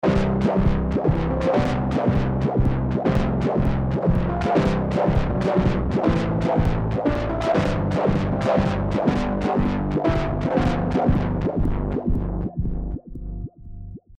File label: Emotional Piano Filter MS 20 Swarm Movement; Pigments